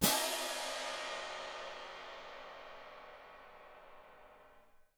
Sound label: CYM P, Percussion; Miroslav Vitous - Percussion & Harp